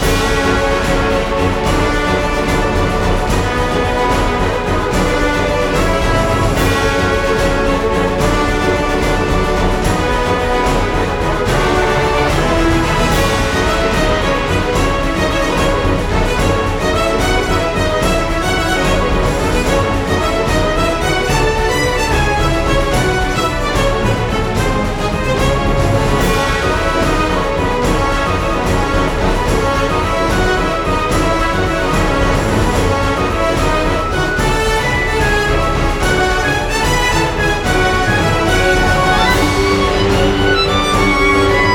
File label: Dramatic loop; PeriTune Material